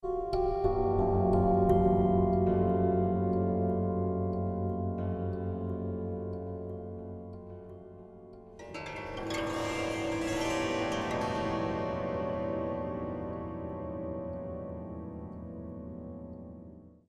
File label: Castle Front